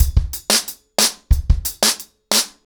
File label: ACOUSTIC DRUMS, 90 BPM